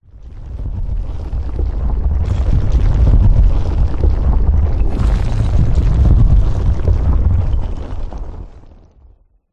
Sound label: nature rocks landslide boulders heavy low frequency rumble